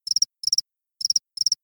cricket; Chat wheel 2017

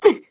Death; Flak

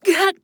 Mage Death; Realm Royale; Wandering Alchemist

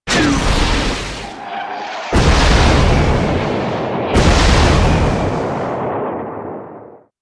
Call Down; Dota 2; Gyrocopter

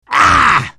pain; Lion

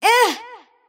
kid pain; invoker

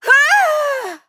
Falling; Imani; Paladins

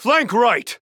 Attack; Darklord; Paladins

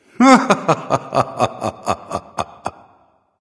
Reindeer laugh; Chiron

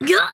Death; ChurchHill

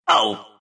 pain, ru

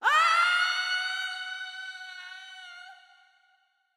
Prolonged screaming; Zarya; overwatch

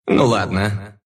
move pain, ru; dota2

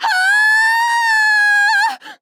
Falling; Princess; Paladins

